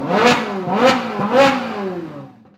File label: lamborghini revving